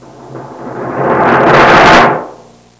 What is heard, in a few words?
timpani drum roll; percussion instruments; Sound Effects for Multimedia - Volume 1